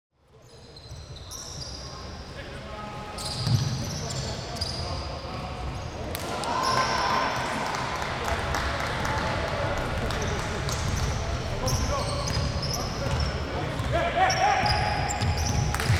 Lost Opportunity, Dribbles, Clap, Tense, Basketball Game; Basketball Game Pro; Sound Ex Machina; Lost Opportunities